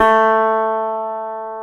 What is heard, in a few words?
GTR, Dobros, National